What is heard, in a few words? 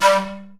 SIKU G2; World Combo